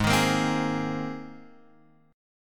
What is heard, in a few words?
Ab Major Add 9th Ab down Guitar Open F; Abadd9 strummed; Abadd9 chord; JGuitar